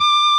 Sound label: solo Left-hand vibrato